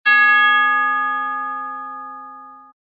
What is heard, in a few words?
chime single medium